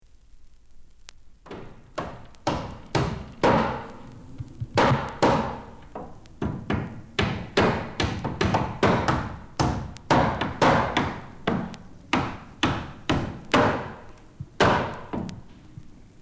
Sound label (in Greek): ΚΑΡΦΩΜΑΤΑ